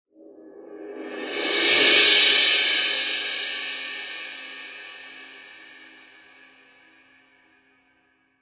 cymbal crescendo

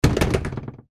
door closing

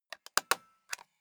stapler staple slow

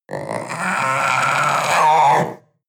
panther young roar

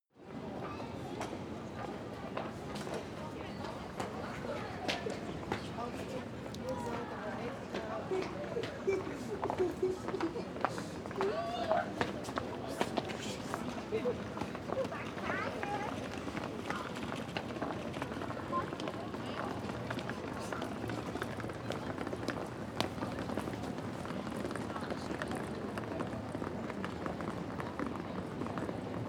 calm park people church bell

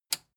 metal button tiny push enable

